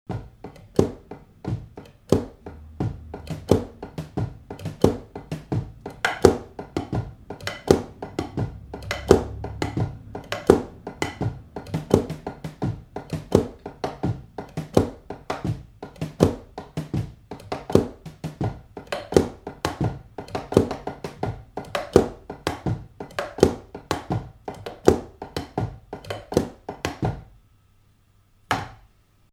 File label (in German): Kopfhoerer Sound Nur Klopfen